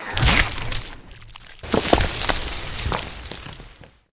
beetle boom death

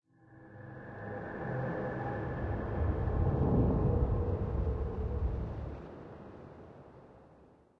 empty ruin; forgottenruin

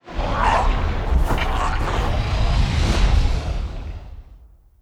death, monster; elimental; lake

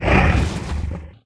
stone damage

